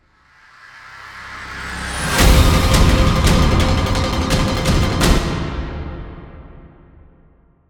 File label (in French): Jingle short instrumental; On S Ennuie Pas Mal; Priorité Ô Direct